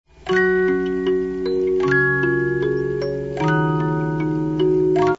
Canon in D 3 Parts, music boxes, 72-Note Movements